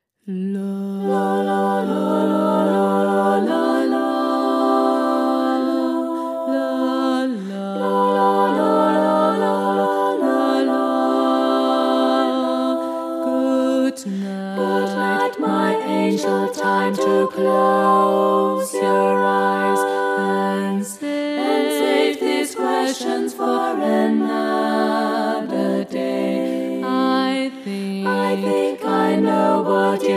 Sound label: Lullabye